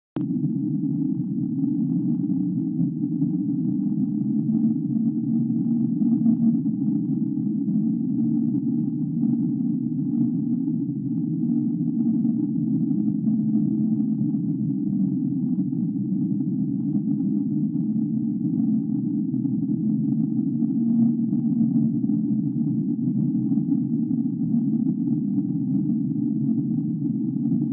gul podvodnogo vulkana

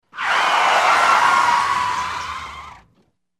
zvuk tormozov v mashine

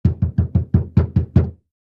stuk v dver

